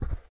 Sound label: footsteps carpet Walk